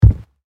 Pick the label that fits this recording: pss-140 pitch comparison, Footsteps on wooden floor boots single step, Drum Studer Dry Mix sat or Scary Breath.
Footsteps on wooden floor boots single step